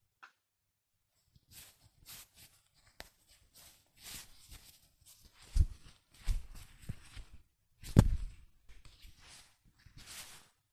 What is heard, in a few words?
rub the umbrella; operation